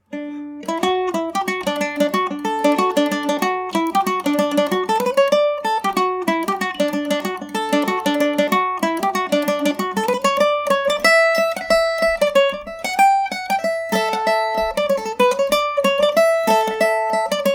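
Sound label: D major md304, irish mandolin; free and easy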